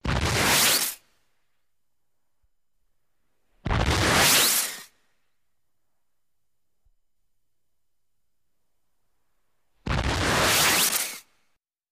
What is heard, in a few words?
Blast Off Whoosh